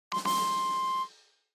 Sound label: fanfare